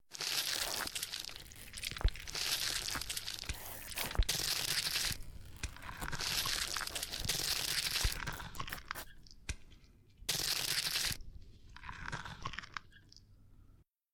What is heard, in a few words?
zombie eating; Zomboid 41.78.16; Project Zomboid